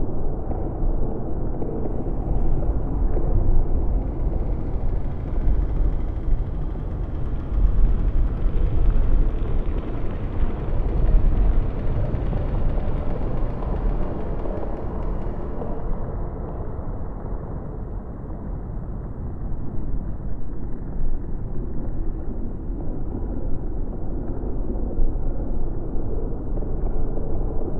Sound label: atmos abyss cocoon